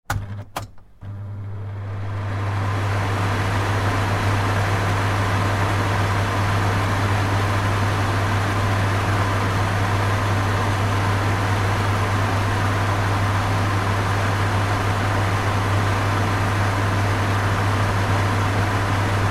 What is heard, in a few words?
white voice; خوابیدن بچه